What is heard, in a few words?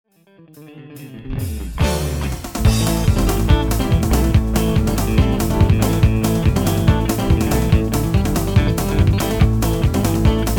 texas groove; haamanao